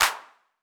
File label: clap; foxdot default